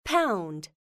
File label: Key Tone Pound; Samsung; GT-E1080F